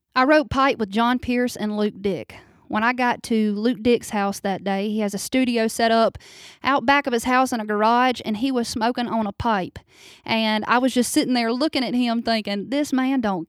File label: Pipe background